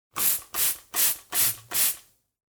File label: water